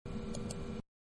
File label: Mouse Click One Time, Computer; Computers (Sound Effects:Electronics); Manhattan Production Music